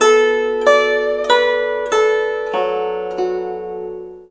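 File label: guzheng; Spectrasonics; Heart of Asia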